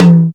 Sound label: mid tom sound e key; tom drum samples